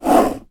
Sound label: household plastic container case small slide across table